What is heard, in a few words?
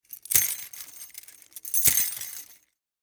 Horror, Chain, Rattle, Restrain, Torture, Device; Scary Soundscape